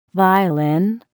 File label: violin